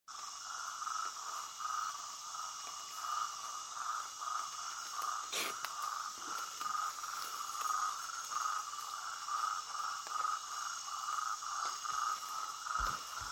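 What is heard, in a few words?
Rhinella martyi, frog; guyane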